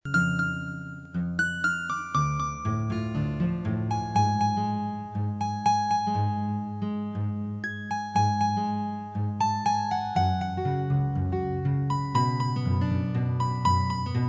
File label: Guitar